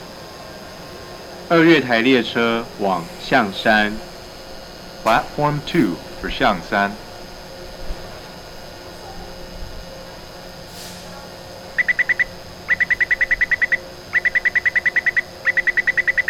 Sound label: shilin for xiangshan, taipei mrt station